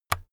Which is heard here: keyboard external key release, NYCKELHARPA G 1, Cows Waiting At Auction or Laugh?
keyboard external key release